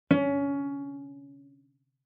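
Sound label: Cello pizz fortissimo sul A Db4; MIS Pitches - 2014; Strings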